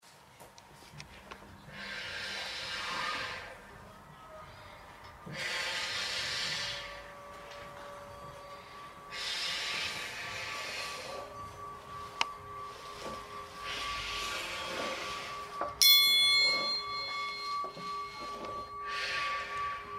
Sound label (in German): Klanglandschaft